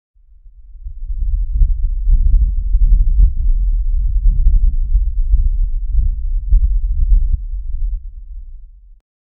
battlefield inspired puls

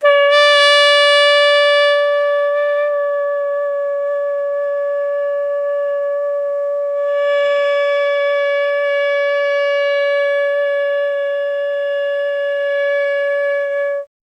alto saxophone long tone; SFX Engine

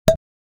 short impact sound for a; shooter

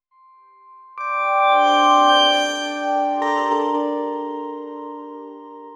ethereal gaming intro

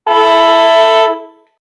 horn sound to play when, competition

